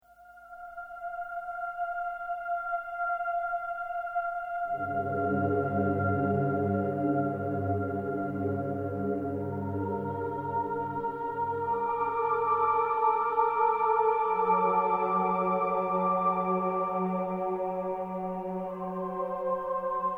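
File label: Underwater Ambience; Star Wars Galaxies An Empire Divided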